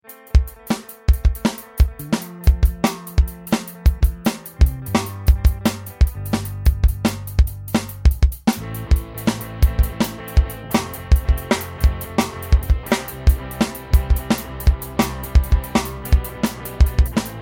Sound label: 4/4 rock with fill